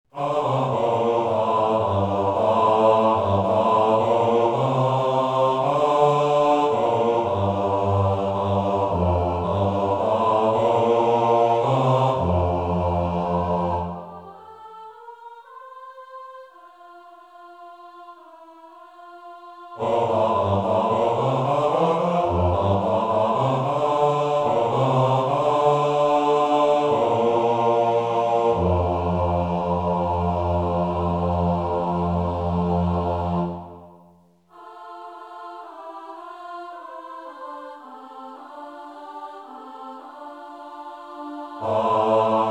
Susanni Bass

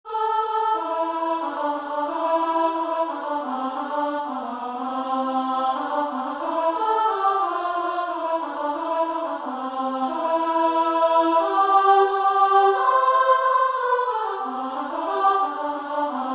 Alto

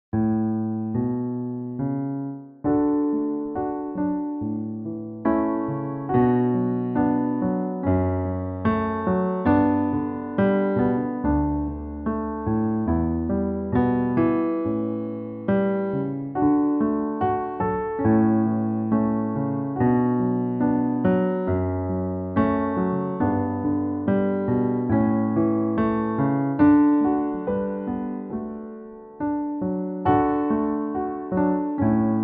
Piano

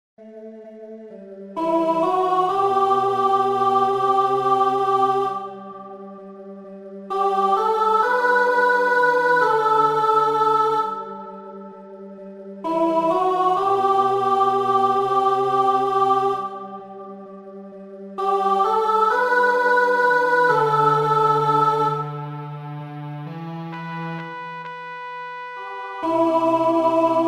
Chorus + Inst Sop

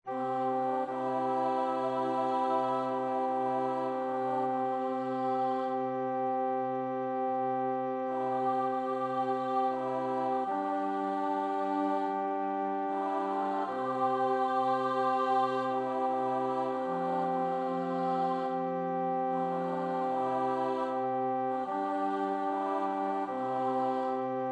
Verse + Chorus Alto

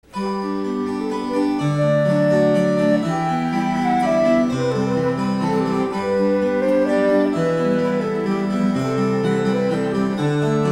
Waltz

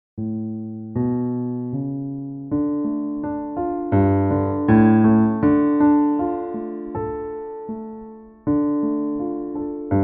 Piano; Nonesuch

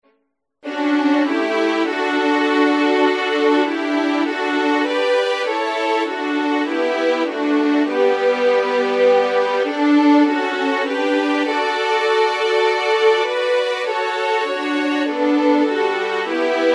Londonderry Air

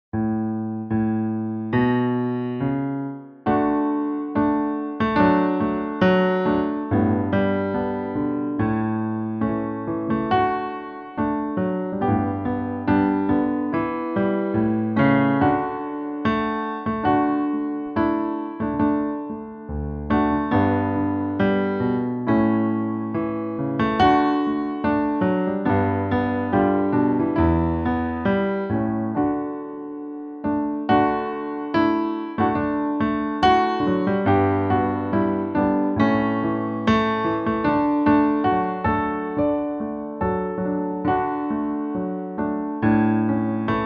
The Mill, Mill, O piano acc

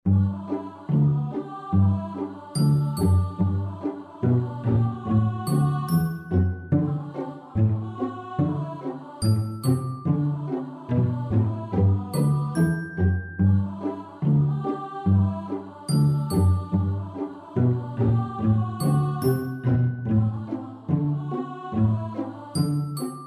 Cello

